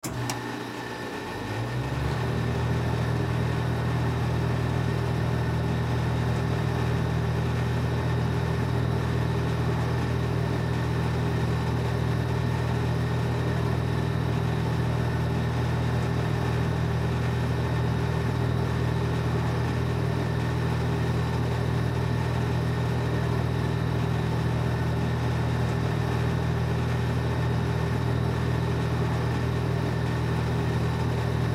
Welding Machine, Welding Machine Generator On Run Off; Welders